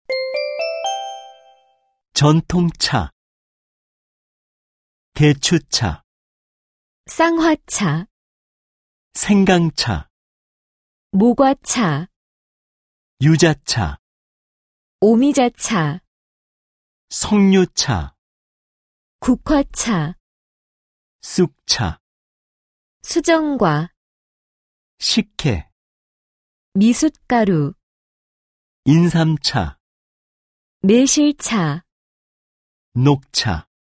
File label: K-POP